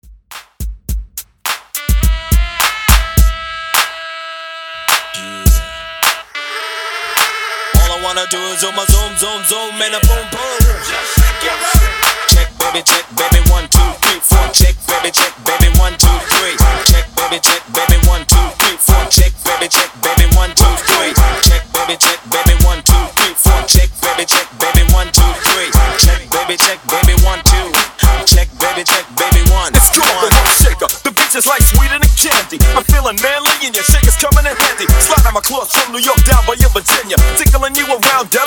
Throwback Oldschool Party Intros 105 BPM

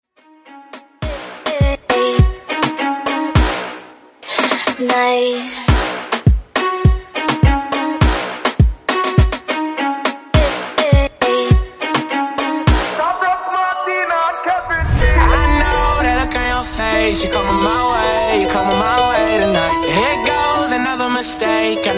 Top40 Moombahton, 103 Bpm

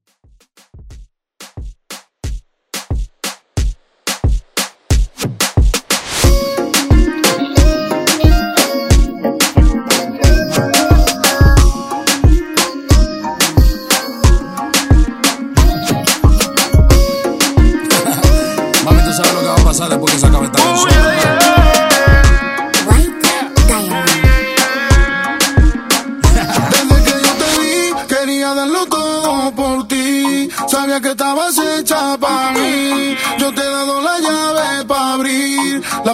Moombah; Redrum

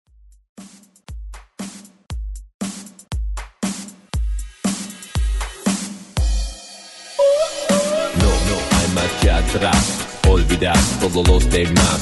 ReDrum 118 BPM

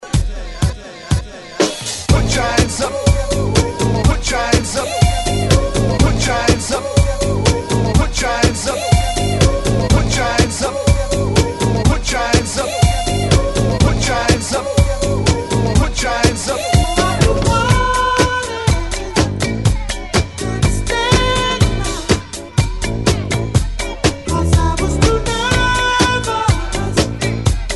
70s Hype Re Drum 123Bpm

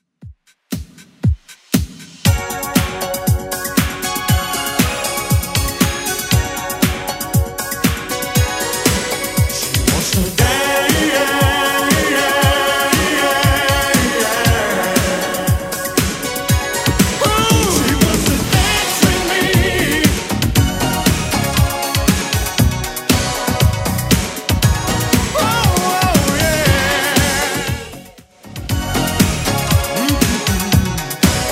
80s Redrum, 118bpm

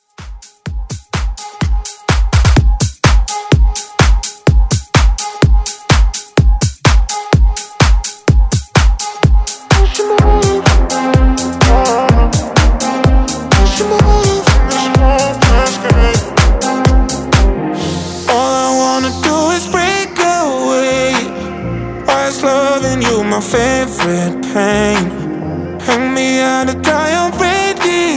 Dance Redrum

